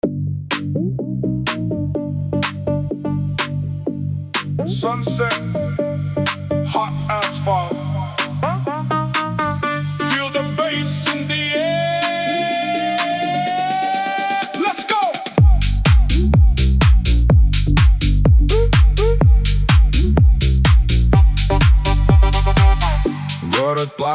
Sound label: summer bass